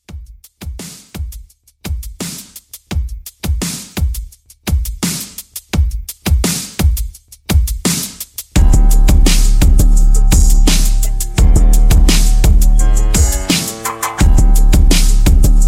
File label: Mixshow Re Drum 85